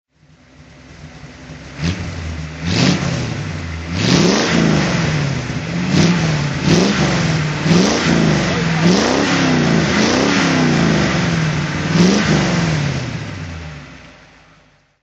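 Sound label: facel vega hk500 throttle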